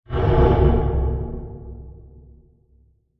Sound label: Scary Blast; Blasts; Impacts; Crafted Signals